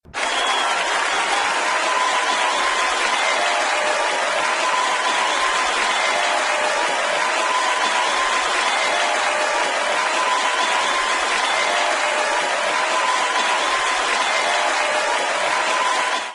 victory cheer

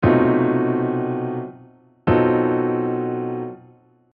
consonance and dissonance in polychords

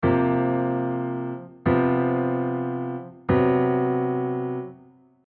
4th chords possibilities

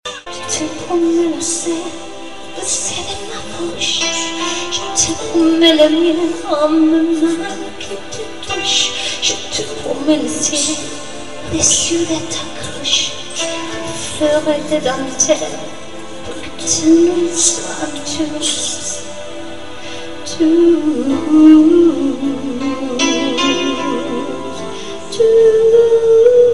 mix songs french

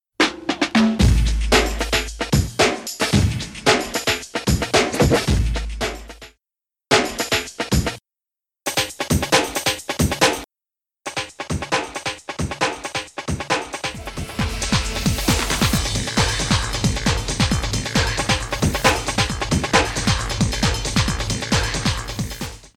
Break

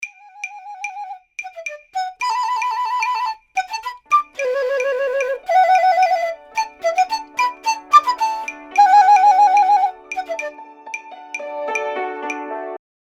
Jingle

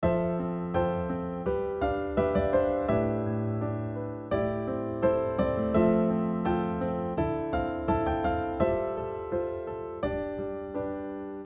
harm mel

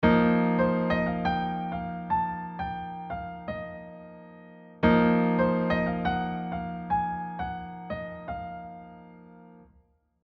quartal 7

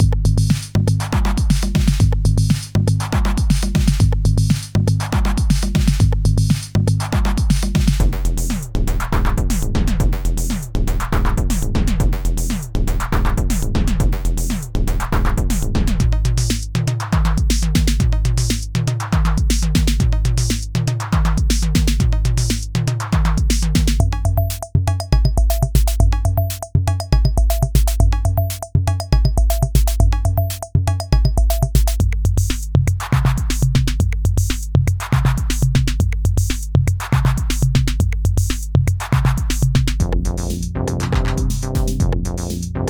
Kits

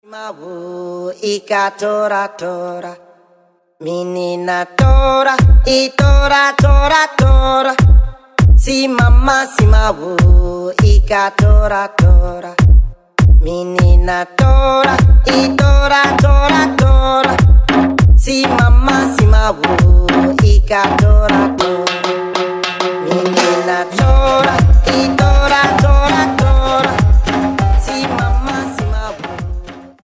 SAMBA (50bpm)

